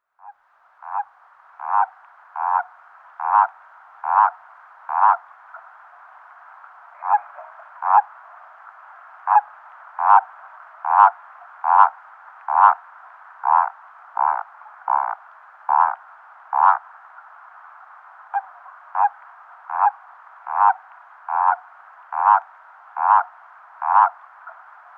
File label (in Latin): Bufo Bufo